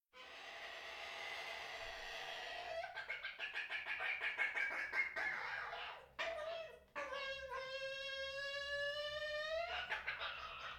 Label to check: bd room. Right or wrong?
Wrong